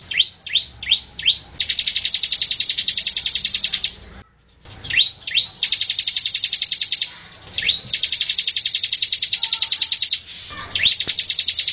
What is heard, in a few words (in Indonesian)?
ciblek